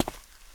concrete; firefight

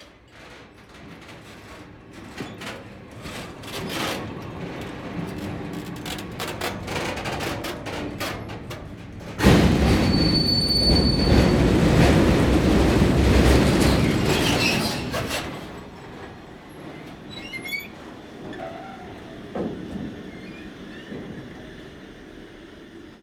shunting track, train; best service Blue Box

